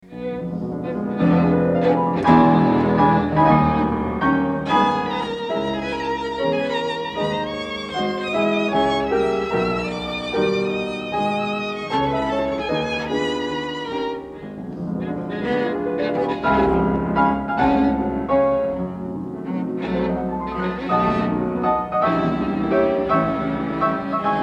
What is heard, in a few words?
Trio in B Op. 8 IV. Allegro; Brahms